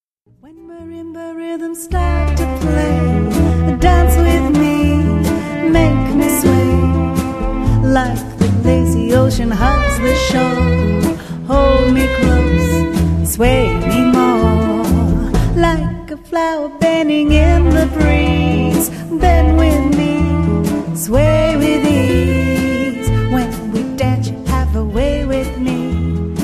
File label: quartet with violin vocals